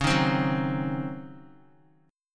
C sharp Major 7th Suspended 2nd Suspended 4th, Guitar; C#M7sus2sus4 strummed; x,4,3,5,6,x; JGuitar